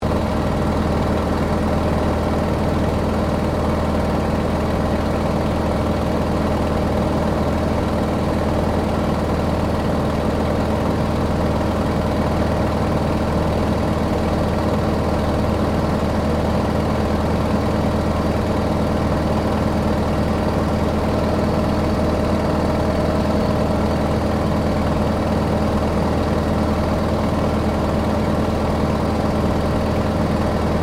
AIRCRAFT YAKOVLEV YAK-52 FLYING INTERIOR, Russian Yak52 Training aircraft